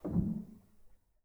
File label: rel, Grand; bitKlavier; Binaural